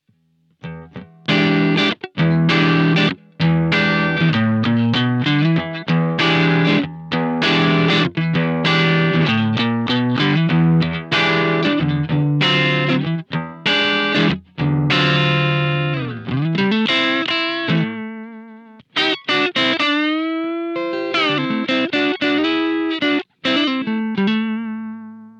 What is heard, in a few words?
PlexiFET crunch